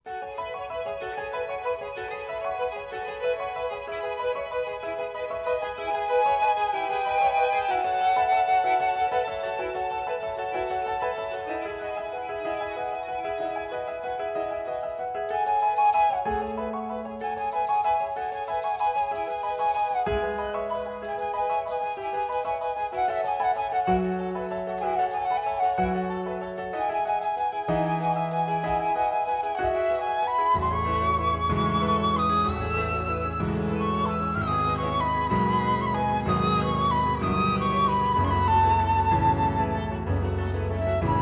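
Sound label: Violin & Flute Duo; classical musicians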